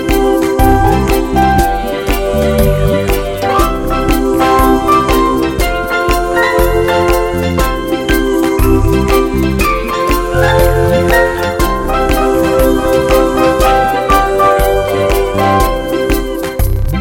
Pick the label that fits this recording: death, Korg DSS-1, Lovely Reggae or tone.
Lovely Reggae